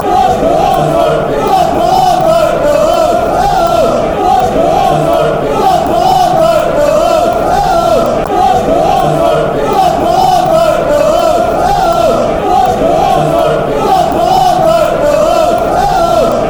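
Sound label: blackpool are back, football chants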